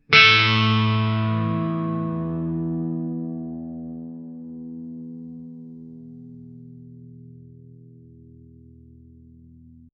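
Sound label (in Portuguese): violão tocando duas notas; acoustic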